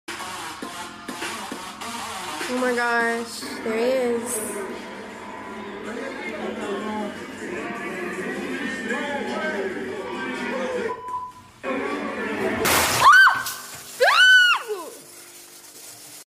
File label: breaking computer; green screen audio meme